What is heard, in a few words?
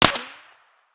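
supersonic snap, bullet snap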